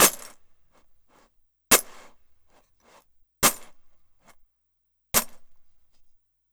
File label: Dog Tags on Drum Head; Sonic Foundry (Sony Creative Software); Continental Drift Aftershocks